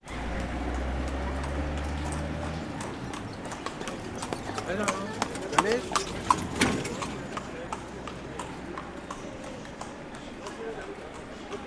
Caleche for hire

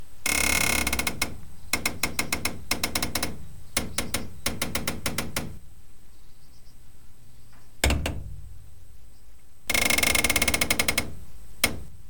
Aluminium Window

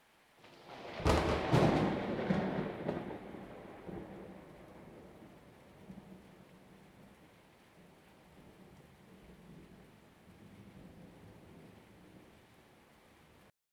Huge Thunder Crack

